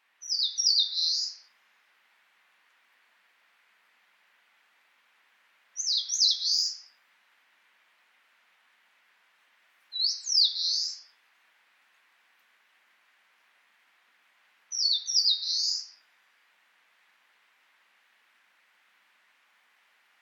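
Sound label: eastern crowned warbler, chichibu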